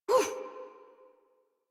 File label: Pain Adult; Warframe; New War; Adult Operator